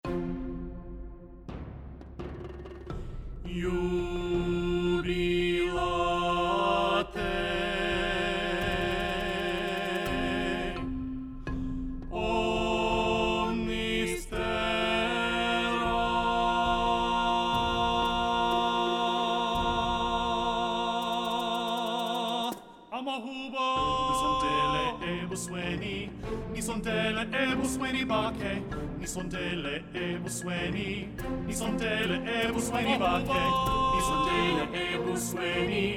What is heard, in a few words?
Ngokujabula Alto Up